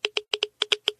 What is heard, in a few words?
low, geiger